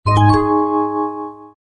xylophone short arpeggiated accent

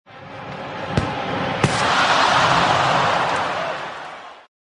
sports soccer big save

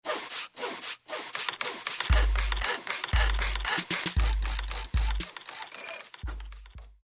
metal pipe

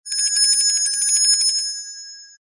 bells bell brass tingaling

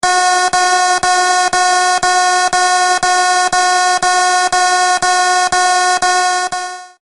Alarm Electronic Fast Ring

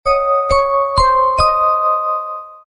xylophone short accent

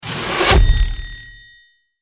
Cupid Arrow Alert

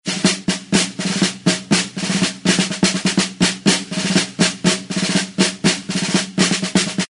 snare drum marching rhythm

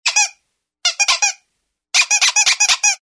squeeze toy

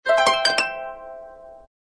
harp high arpeggiated chord

